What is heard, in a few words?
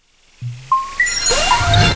ESCAPE; AKAI S6000 CD-ROM - Volume 1; CYB POLIS